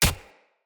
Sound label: Short Sword Impact Player Flesh; Weapon Impacts; Enemy; Swords; Sinecure Short Sword